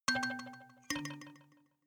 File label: notification kalimba delay third up